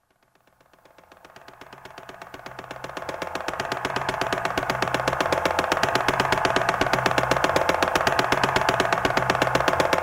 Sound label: noise